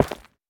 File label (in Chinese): 下界岩破坏声